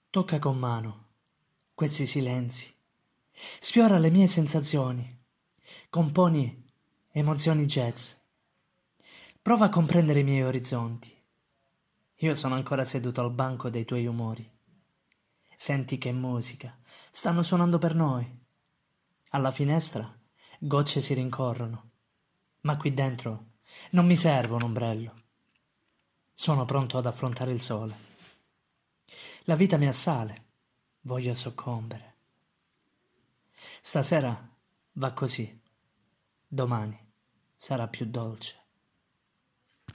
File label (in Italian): Emozioni Jazz